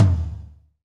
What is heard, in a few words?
TOM TOM, Roland